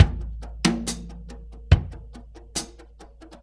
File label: Earth Loop, Drum Tools, Laid Back; Sonic Foundry (Sony Creative Software); Loops & Samples Drum Tools; Gone to Earth